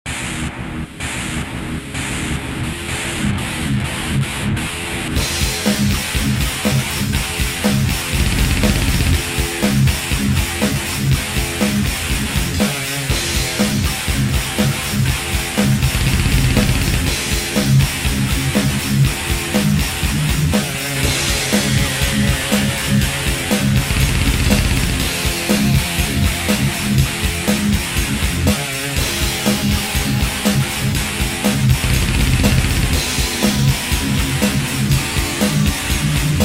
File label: bagpipe mix